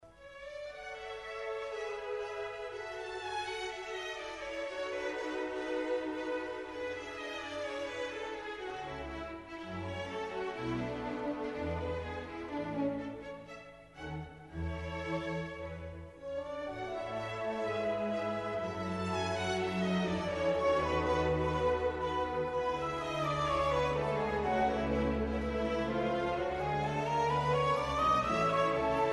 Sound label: piano concerto a major; mozart; k488